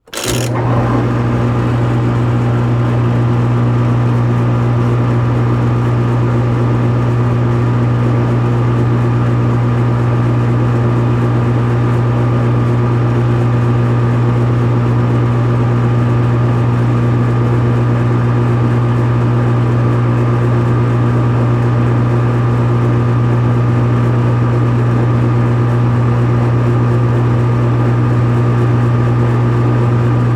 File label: Dishwasher Turn On And Off; household